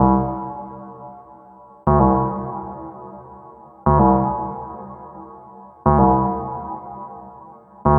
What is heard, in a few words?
Bass Stabs